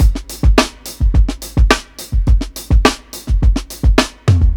105 OFF BEAT, DRUMS; LOOPS; BASEMENT DRUMS VOL 2

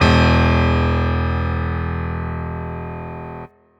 piano stab

